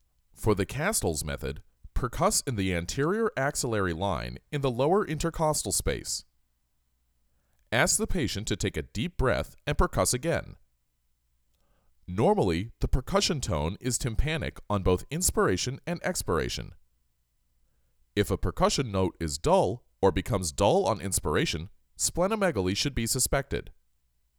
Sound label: Percussion; Ab Percussion